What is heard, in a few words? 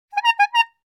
horn cartoon; NCH Library